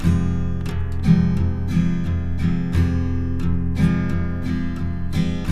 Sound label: TK MIN F#2, Guitars; Spectrasonics; Hans Zimmer Guitars VOL-1